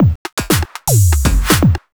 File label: loop, synthesizer